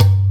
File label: MINI DRUMS; Pro-Rec - Synthsonic; TRINITY